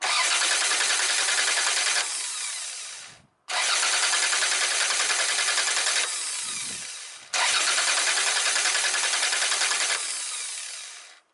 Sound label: cranks but no fire